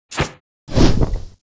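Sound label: Mob attack; Bossmob; 욱일승천류; 히야미; 2단계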